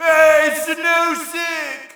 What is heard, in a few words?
Shout, audience; UFC Tapout 2